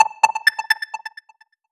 waiting loop, sound track

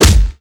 dmg hit

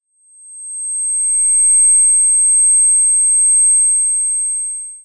ear Ring; Invector-3rdPersonController; Basic Locomotion

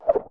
options pop out

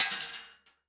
Shell Metal

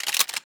Shotgun Pump; Shooter

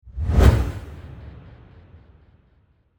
Dunk; VR-GAME

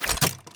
Shooter Melee Slash; Opsive; UltimateCharacterController

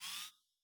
Assault Rifle Zoom In

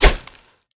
Bullet Impact Sand

